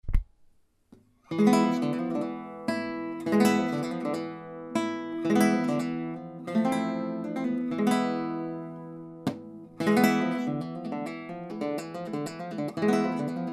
Flamenco Guitarist Solea

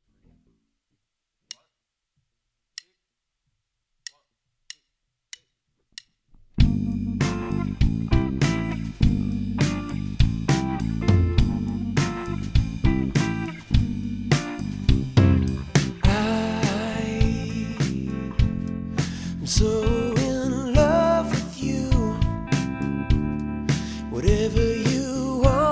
Cover Band

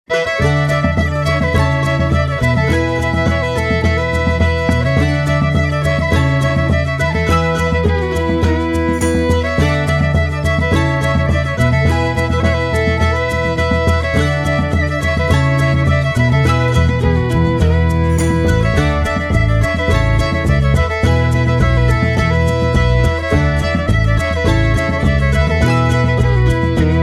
Bluegrass Band, Bluegrass trainbeat

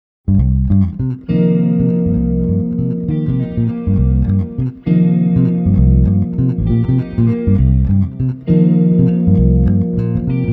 Jazz Duo; Boston; Jazz Standard

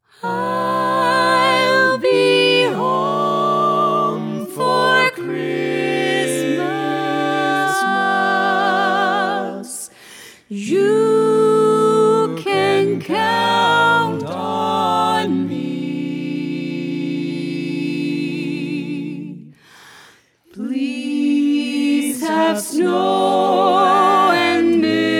Carolers; Boston

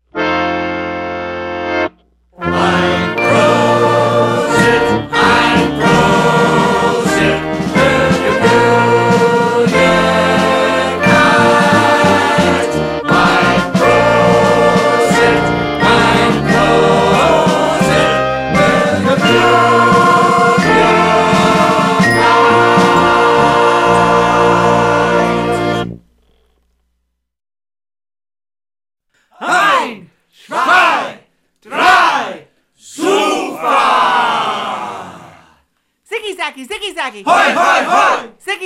German Band; Boston

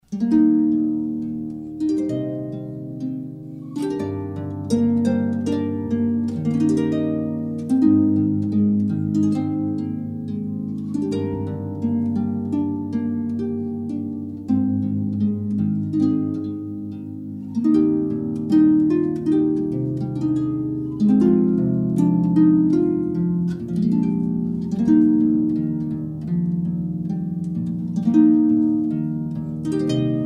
Harpist; Seattle; Leslie McMichael

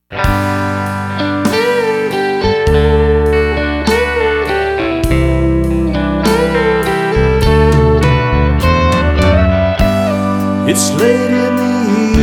Classic Rock Band

